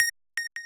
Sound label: lead